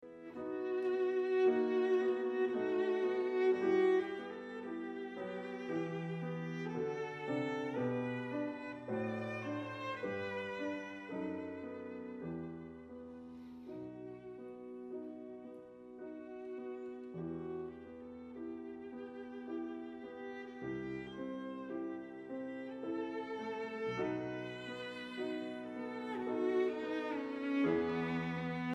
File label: arpeggione